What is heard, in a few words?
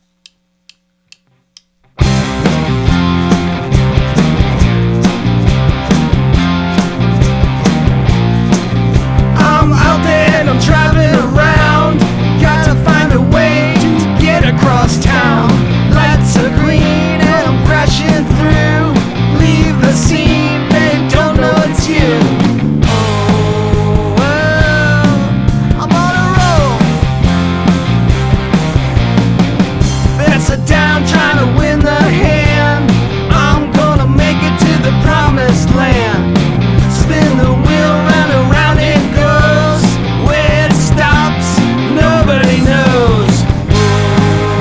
w voc maraca